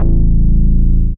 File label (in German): Long Mono, baesse